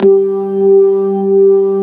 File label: B3 HAM, Keyboards Old School